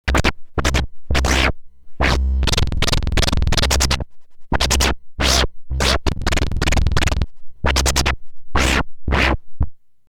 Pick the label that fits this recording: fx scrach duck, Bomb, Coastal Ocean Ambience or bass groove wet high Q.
fx scrach duck